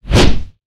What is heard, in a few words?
Melee Whoosh; Evolve; Goliath